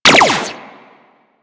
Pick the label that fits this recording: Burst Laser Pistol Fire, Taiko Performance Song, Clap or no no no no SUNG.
Burst Laser Pistol Fire